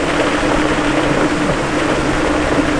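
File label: surface mud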